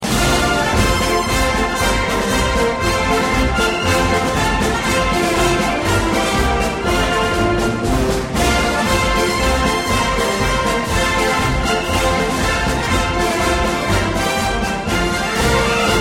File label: Marine Corps Hymn